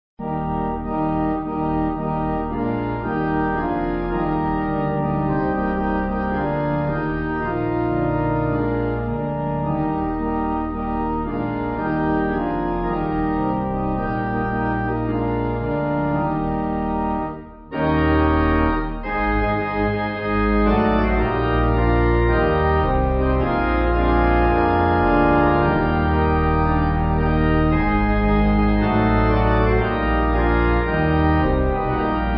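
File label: Pipe, pipe organ; The Lords Our Rock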